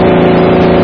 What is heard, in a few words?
American car; 暴力赛车